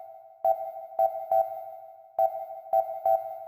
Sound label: MELODIC SO, Techno 138 BPM; Best Service ProSamples vol.54; AKAI; HI ENERGY